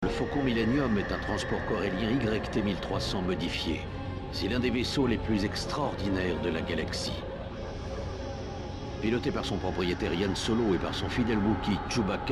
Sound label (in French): Faucon Millenium; Star Wars